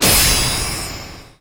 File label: Return Shot; Disney Star Smash; Android, iOS, Mobile